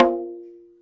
arabic perc, Arabic Percussion Kit; ETHNIC and WORLD PERCUSSION; Arabic Percussion Kit 3

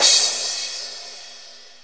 deep crash, DRUMS (SINGLE HITS), Crashes

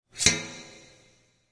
backwards clang; Weird and Interesting Hits; single hits

